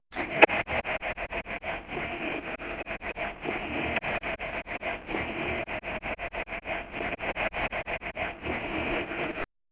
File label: scratch